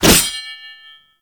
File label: Whoosh, Weapons; Infested; Coda Mire